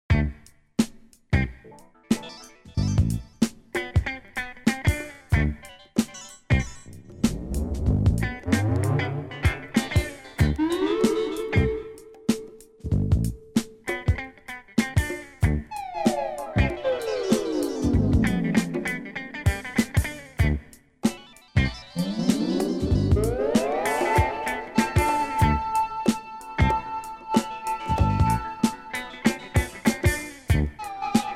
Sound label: iron office ambience